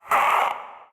Vocal Pain; Warframe; PriestQuest; PossessedRedVeil

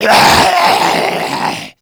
Mariner Zombie Attack, Vocals

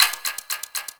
hack minigame UI time tick; Warframe; Duviri

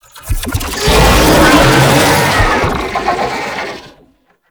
Infested Oni Vocal Idle; Warframe

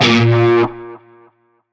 Shawzin Oct One A Sharp; Music; Warframe; Narmer Shawzin

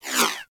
Laser Whizzby; Warframe; Bullet Impacts; Whizzbys

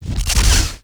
Shock Rifle Alt Pre Explosion; Warframe; Weapons; UT Weapons

